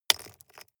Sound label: drop stones small